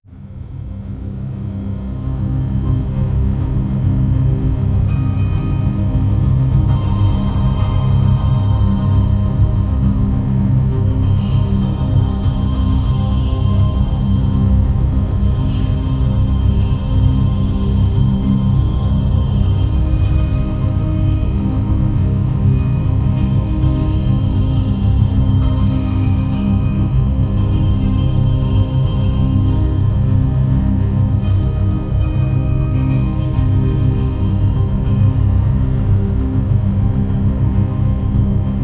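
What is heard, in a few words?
suspense short second, cinematic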